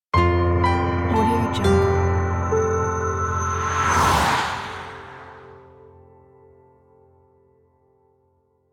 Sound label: inspirational intro logo